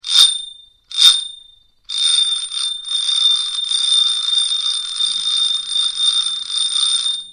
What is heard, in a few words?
tokyo bell